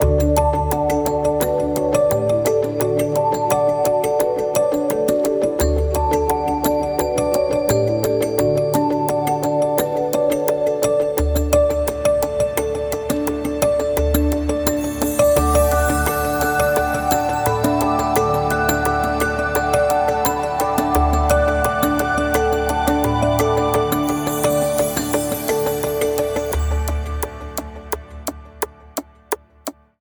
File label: Eggs instrumental